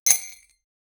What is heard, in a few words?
picking up diamonds in a